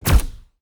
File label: gun silencer